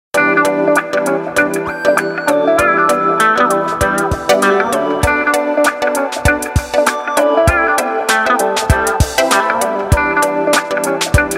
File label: Wah Wah, Nokia Tones; Windows Phone apps